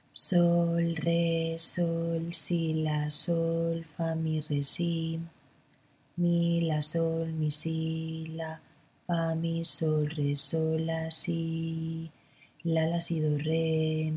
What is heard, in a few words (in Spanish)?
ritmo 6-4